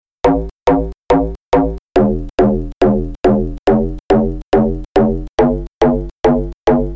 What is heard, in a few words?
hard bass; A hard, club style off-beat bass. Popular in many hard trance songs.